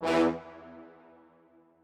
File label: strings